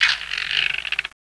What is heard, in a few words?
Creak